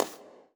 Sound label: fs tile, footsteps; player; Portal 2